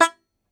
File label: Tenor Banjo, Plucked Strings